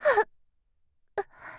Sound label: die; Eternal Return; Tia; Voice Line; KOR